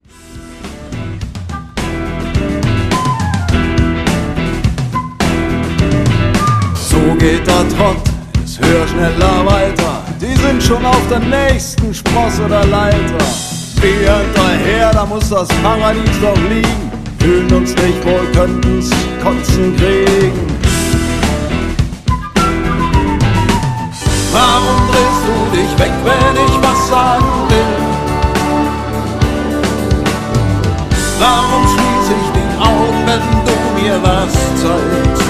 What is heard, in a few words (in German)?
Trommel Lied